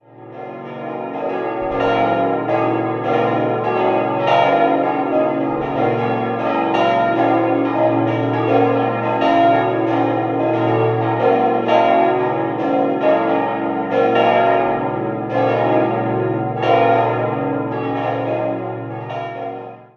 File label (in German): Aichach alt, Glocken; Stadtpfarrkirche Mariä Himmelfahrt